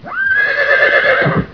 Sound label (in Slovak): Kon, Zvierata; Revelation Sight and Sound SK